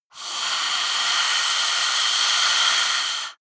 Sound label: breath female eerie hiss